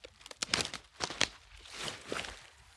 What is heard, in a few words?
Trudge Step, outdoor, Footsteps